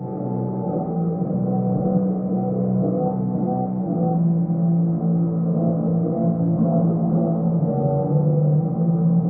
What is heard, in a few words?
theme loop; hl2